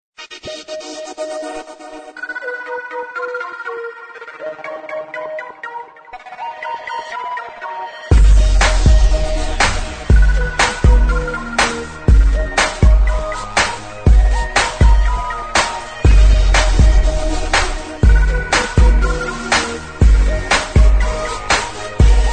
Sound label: Slow Remix Ringtone